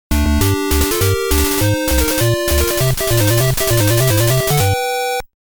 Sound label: All Clear; Nekketsu Kouha Kunio-kun Ongakushuu